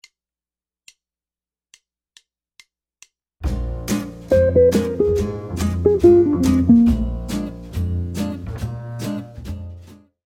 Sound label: 7th Licks, jazz guitar